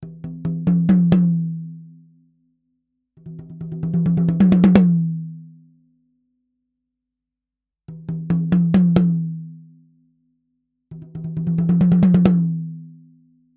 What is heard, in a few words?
tom; beyerdynamic tg d35d